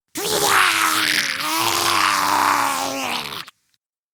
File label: Arm Pain Grineer Ghoul; Grineer Ghoul Expired; Battle Barks